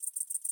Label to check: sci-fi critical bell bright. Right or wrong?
Wrong